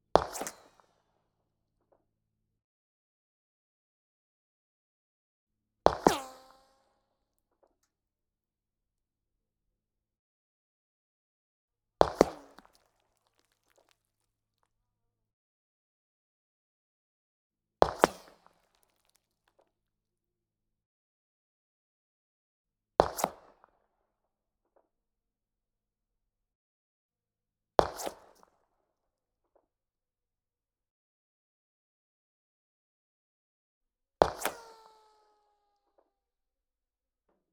M1911A1, Firing, 200m, Front, Target, Colt M1911A1 semi-automatic pistol; Pole Position